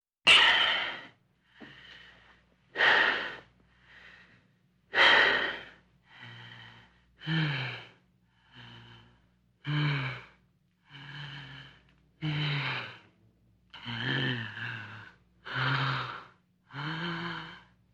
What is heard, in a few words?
Female out of breath